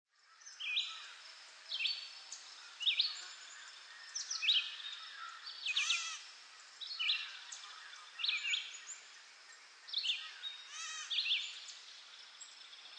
Nature Ambience Birds In A Park, Light nature birds ambience; Quiet park area with few birds singing and tweeting cheerfully; Environmental Sound Effects; LynneMusic